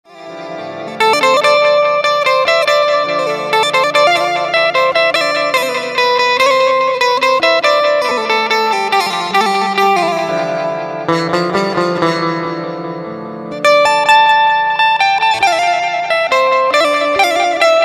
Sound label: banjo ringtone music ringtone